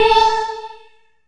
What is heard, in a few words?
Synth Hit A; Trance; Synth Hits; Zero-G Trance Inducer; 90 s Sample CDs